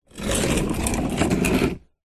industrial heavy duty plastic trunk or object move slide scrape on lightly gritty; zapsplat